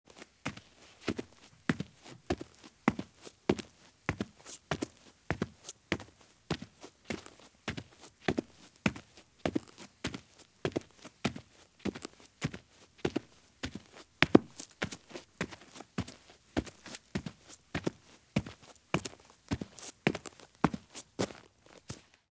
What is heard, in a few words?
Heavy Boots Walk at Very Fast Pace on Dirt; Sound Ideas; Footstep Sound Effects